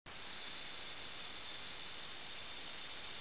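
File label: rattlesnake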